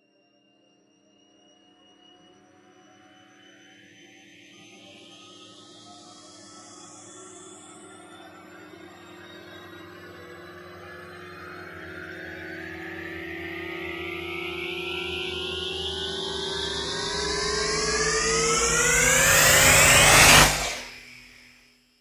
Risers Hyperbolic; Gothic Storm Music; Advanced Sound Design 2012

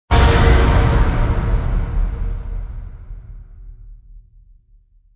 Hyperjump Orch; Gothic Storm Music

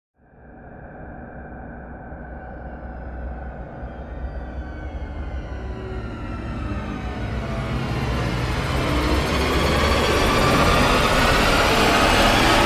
Airlock Rise; Gothic Storm Music

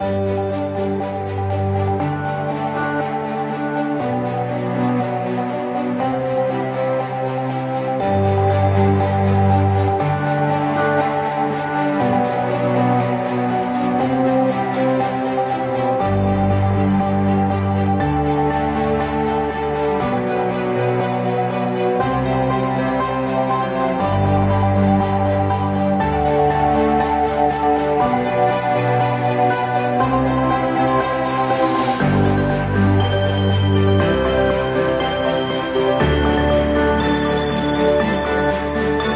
Ambient

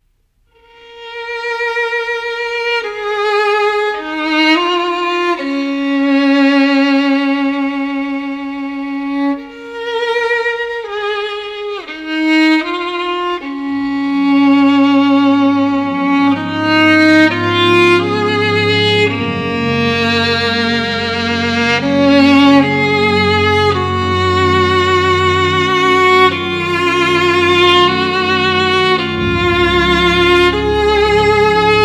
Intro Romance For Violin

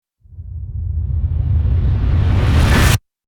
Limit Wipe Wipe; Ninja Tracks

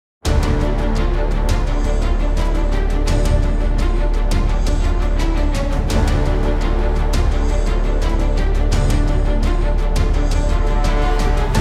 Herculaneum (Without Choir); Fired Earth Music

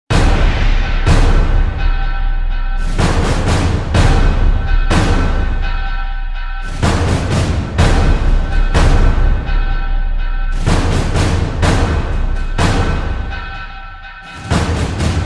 Drums Only Version

